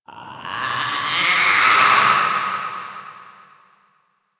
Infested Runner Dist Vocal; Warframe; Runners

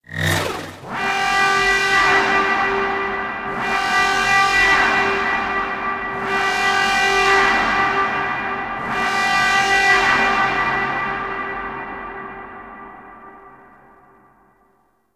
Defense Start Waves; Missions; Corpus

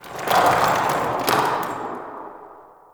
Day Rock Slide Cliff; Ambience; One Offs; Glades Of Andurin

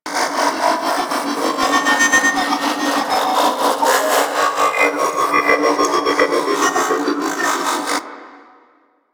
Ship Advert Bot Hover Loop; Ambience; Props; Warframe; Corpus Ship Remaster

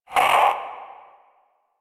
Possessed Red Veil Vocal Pain; Warframe; Priest Quest

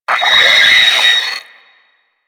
Sentient Brachiolyst Death

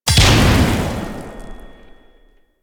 Flame Spear Throw Explosion C, Grineer, Weapons

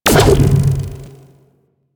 Cluster Shot Launch; Enemies; Abilities; Warframe; Teralyst; Rainalyst

